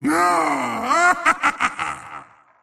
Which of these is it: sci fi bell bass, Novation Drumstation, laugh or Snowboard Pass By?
laugh